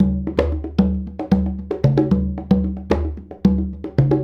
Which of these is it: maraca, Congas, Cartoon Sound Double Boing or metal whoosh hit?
Congas